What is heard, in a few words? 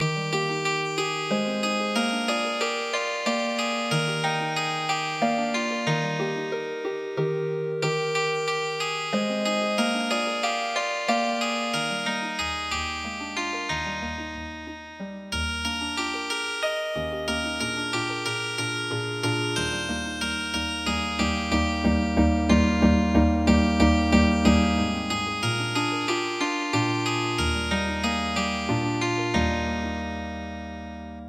Healing Harp, traditional; Drink to Me Only with Thine Eyes